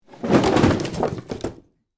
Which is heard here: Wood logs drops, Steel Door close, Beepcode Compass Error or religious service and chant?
Wood logs drops